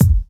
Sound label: original steel kick drum g key